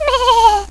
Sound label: alert, headcrab